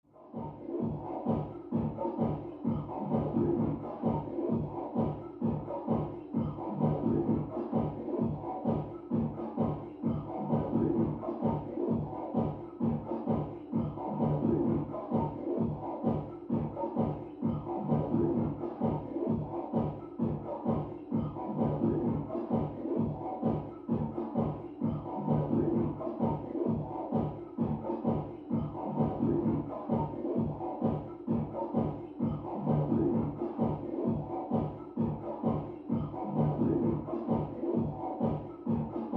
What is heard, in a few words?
Mx Electronic Dance